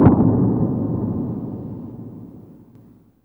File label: THUNDER FUZZ, Impacts, Hits & Booms; Distored Reality